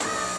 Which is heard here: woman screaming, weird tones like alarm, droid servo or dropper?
droid servo